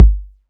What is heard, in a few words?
BD, Dance Mega Drums, BD HOU; Best Service